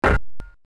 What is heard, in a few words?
metal land, footsteps